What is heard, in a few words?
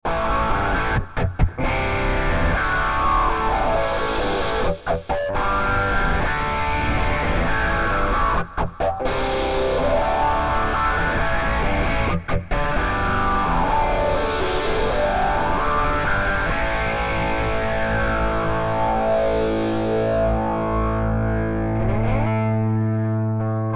Brown Phaser